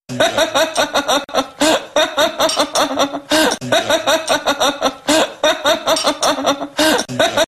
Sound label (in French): rire malsains loop; francois damiens